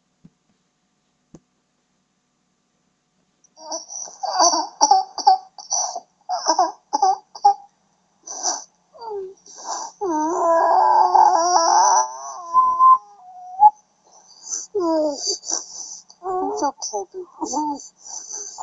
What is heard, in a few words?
croup